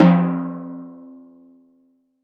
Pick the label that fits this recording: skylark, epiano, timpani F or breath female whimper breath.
timpani F